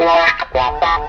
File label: guitar, loops